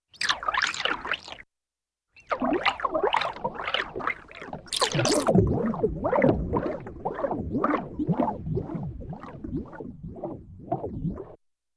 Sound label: Pouring III; Yamaha TX16W; Sound FX